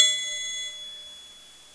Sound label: Ding